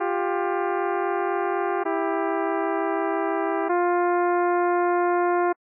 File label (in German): diskantklausel